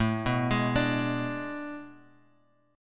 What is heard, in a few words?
A Major 7th Suspended 2nd Suspended 4th, Bass Standard; AM7sus2sus4 arpeggiated; 17,14,18,19; JGuitar